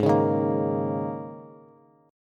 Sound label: Ab Suspended 2nd Suspended 4th chord, Bass; strummed; JGuitar